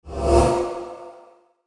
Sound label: transitional swipe; ai interactive comic book